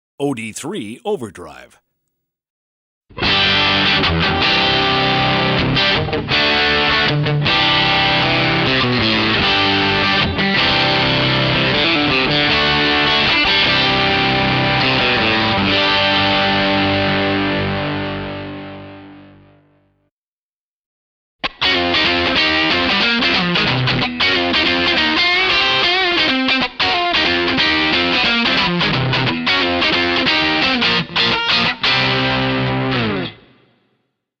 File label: tone demo